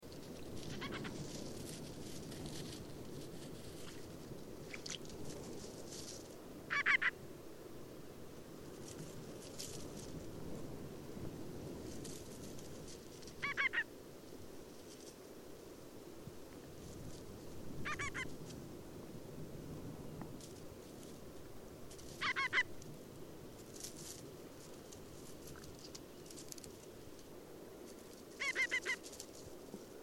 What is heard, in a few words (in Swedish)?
Brushane; Ruff; Philomachus pugnax; Patrik Åberg